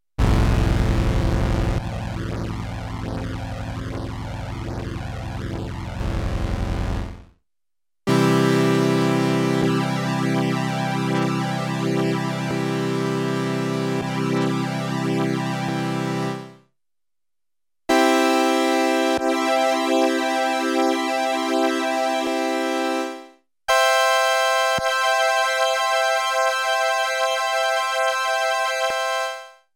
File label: ensemble effect, Kawai sx240